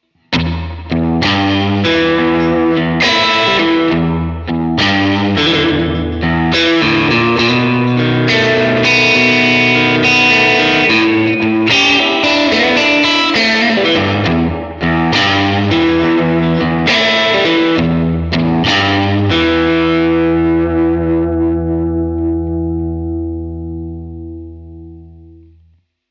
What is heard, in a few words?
Reverb